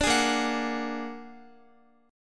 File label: Bb Augmented 7th, Guitar; Bb+7; strummed; x,x,12,13,10,13; 4E,4A,5D,5G,5C,6F